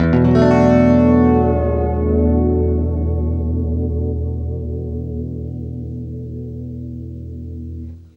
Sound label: FLESH CHORDS, Guitar; East West; Steve Stevens Guitar Samples Collection